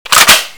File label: reload slide; minecraft